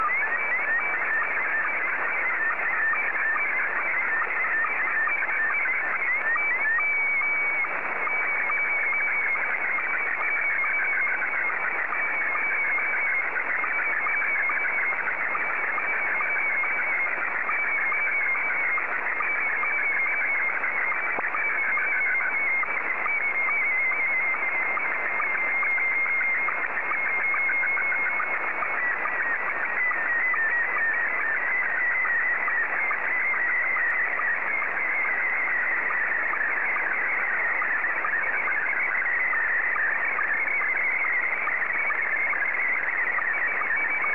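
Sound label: Scottie 1, slow-scan television; ZL2TKM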